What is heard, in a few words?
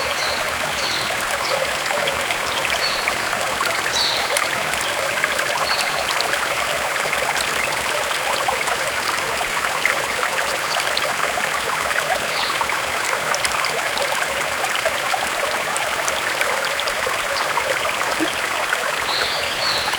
create the sound of water, river